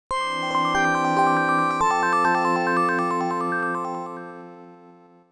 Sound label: Alert; Samsung; SGH-i310